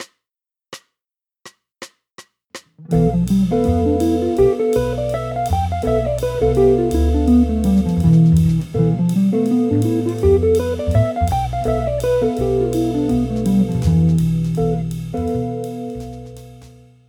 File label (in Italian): Bm7b5 in posizione di C